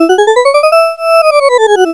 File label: run up; Web Clip Empire 150,000